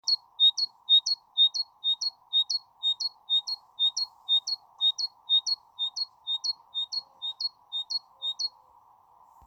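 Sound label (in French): Chant Mésange charbonnière; Gour des Veroniques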